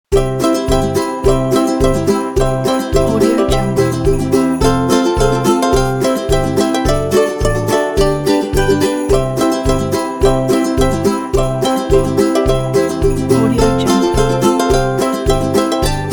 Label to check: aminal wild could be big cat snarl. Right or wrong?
Wrong